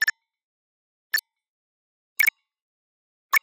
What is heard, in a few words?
Generic Click Digital